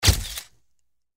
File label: Camera Shot; Infernal Radiation